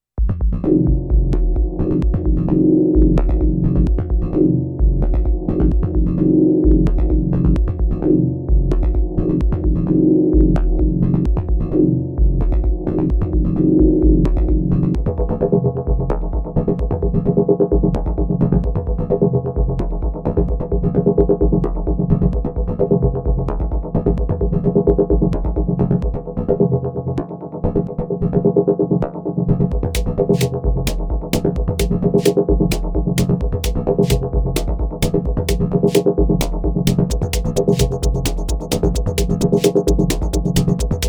Moving short delays.; Syntakt; FM-based; Sorry for the annoying clicks, they were less pronounced in my headphones.

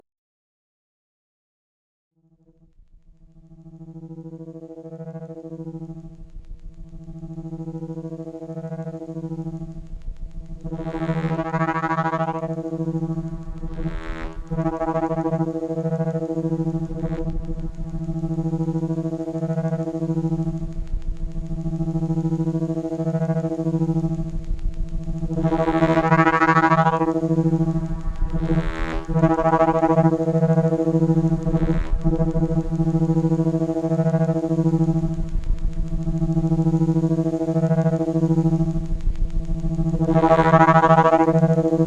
serge + reverb

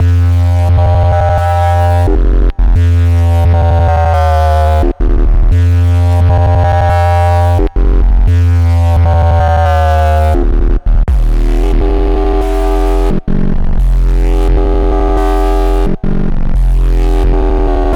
And just the bass isolated (different notes); D&B

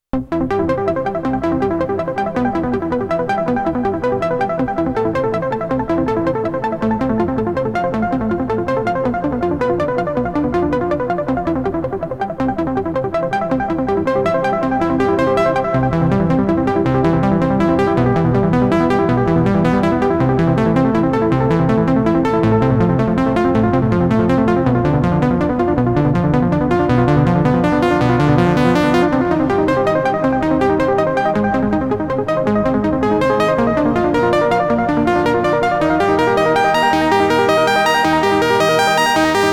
PWM Malevolent (and its arp)

